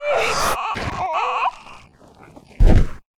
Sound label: Die, Mob; Trifead